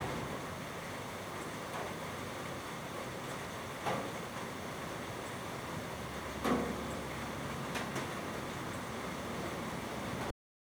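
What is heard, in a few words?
background noise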